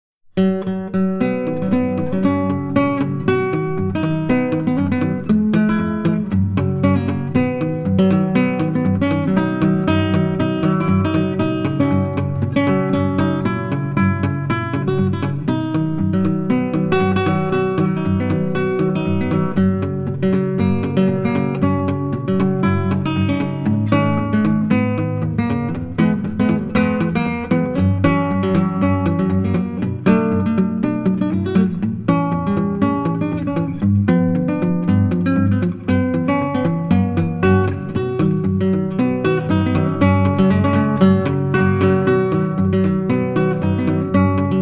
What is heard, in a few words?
Picking